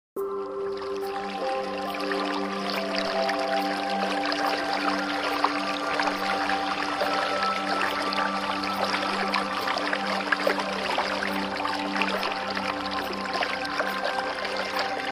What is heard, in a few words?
Forest and piano